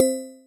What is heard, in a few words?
Toy Piano; Instruments; Synth Keys; Ableton; Factory Packs; Synth Essentials; Collision